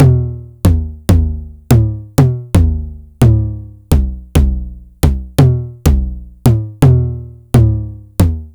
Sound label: Roland Tr-909 low tom